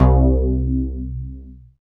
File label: BASS STER.